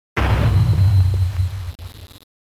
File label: click sound; minecraft 1996